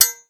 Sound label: Sword Hit; 1001 Sound Effects